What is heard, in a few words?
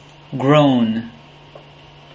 groan